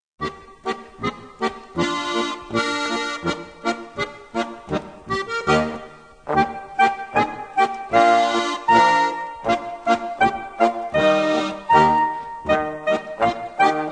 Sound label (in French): Kreuzpolka, Autriche